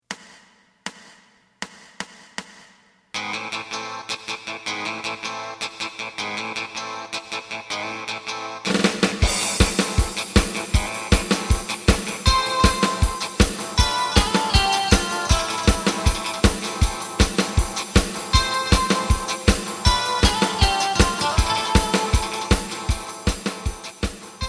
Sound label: bass